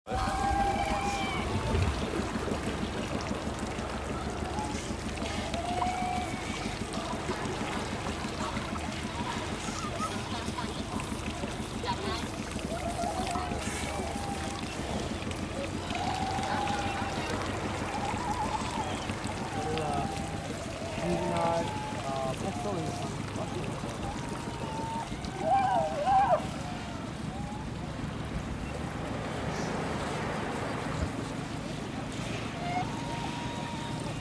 Right Whale bay South Georgia morning zodiac cruise